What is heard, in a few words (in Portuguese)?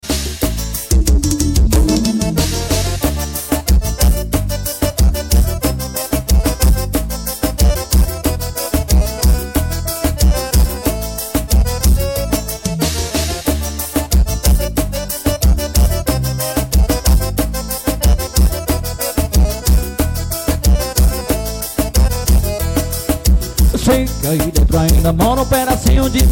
forro